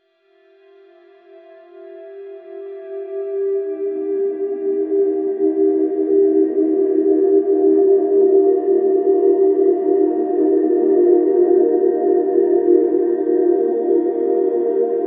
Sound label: mystical spiritual light, mystical